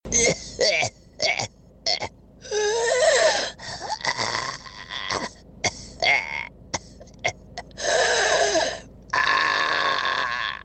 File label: ihhhh heheheahhhh